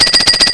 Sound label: BICYCLE; HAUS